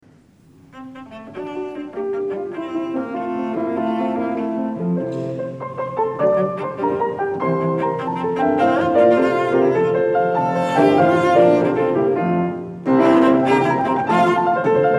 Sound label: Cello Sonata in F Major Allegro vivace-Adagio; Beethoven; Op. 5 No. 1